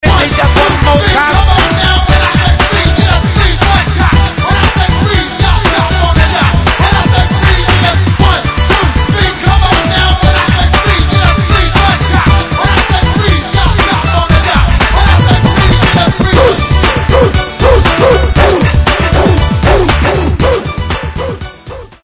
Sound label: chinese lady 118bpm